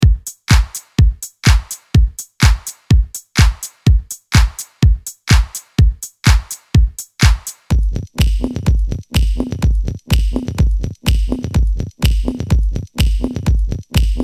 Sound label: drums snares claps